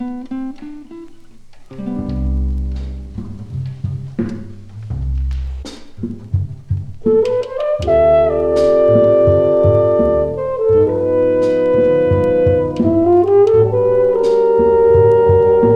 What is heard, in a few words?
4 french horns plus rhythm